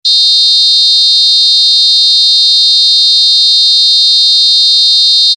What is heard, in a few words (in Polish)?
Ton